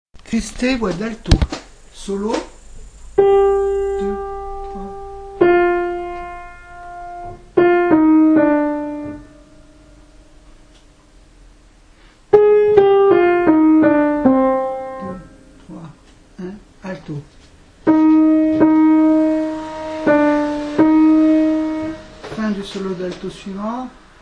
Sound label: Christe, Alto; Niccolò Jommelli, Missa pro defunctis